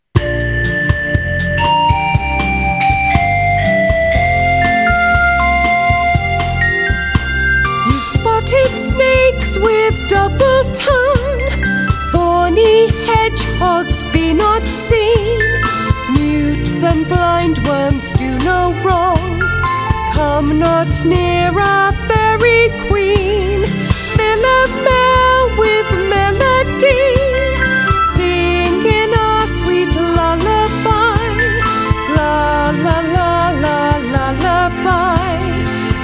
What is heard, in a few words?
Lullaby